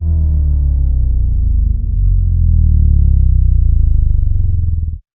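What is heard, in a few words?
Bend Descending Bass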